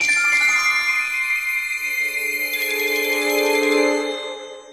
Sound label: Stinger Suspense; Back to the Future: The Game; Episode 1